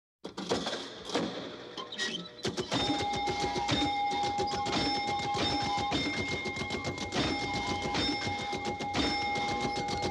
ratternder spielautomat mit glocke; Gluecksspiel

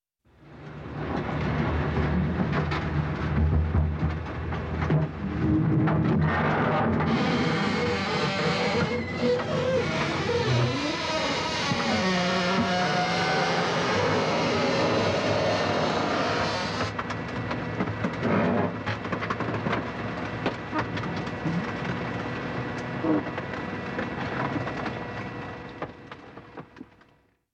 autopresse; Geraeusche

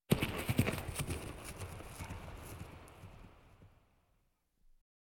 galoppierendes pferd; Auf dem Land

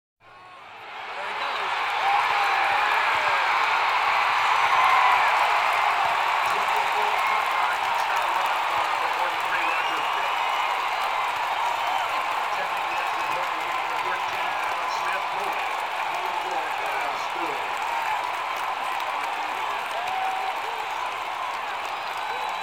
fußball - stadionatmospäre; Sport

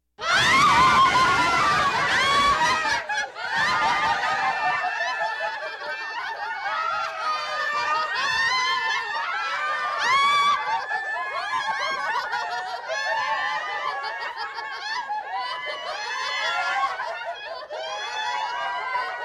hysterisch lachende frauen

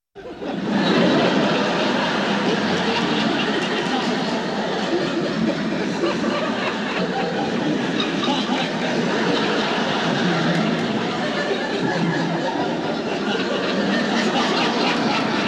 publikum, Lachen

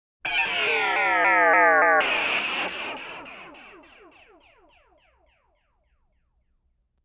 ufos - meteoriten kollision, Science Fiction

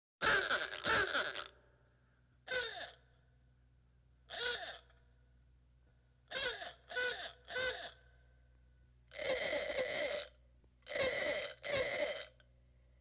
oldtimer hupe; Auf dem Land

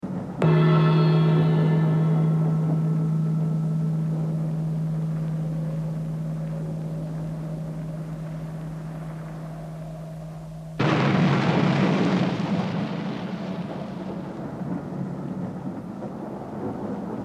Gongschlag; Horrorgeraeusche

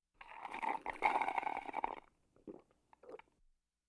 strohhalmtrinken; Alltagsgeraeusche

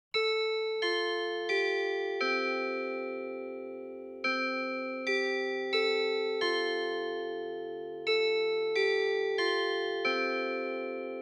standuhr; Auf dem Land

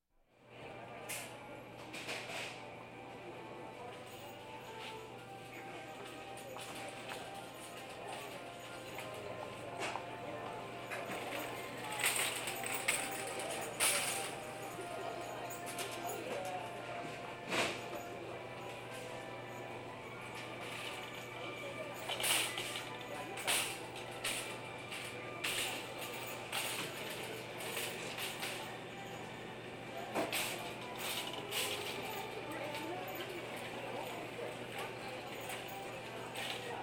einkaufen im supermarkt; Großstadtgeraeusche

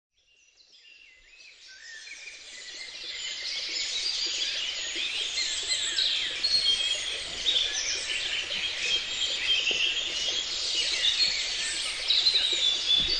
morgenstimmung im westerwald, Geraeusche; dual & audio

